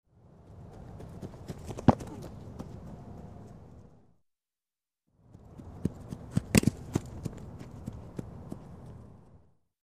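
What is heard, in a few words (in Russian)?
футбол вратарь ловит мяч на бегу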